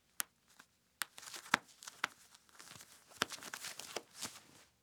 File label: Clothes Off With Button Snap; Fairlight Sound Lib